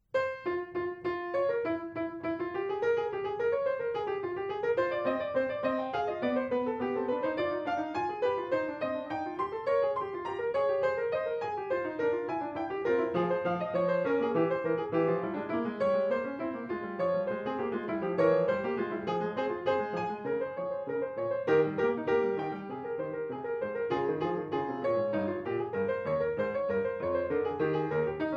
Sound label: Fugue F minor; Bach